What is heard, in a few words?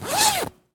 chuck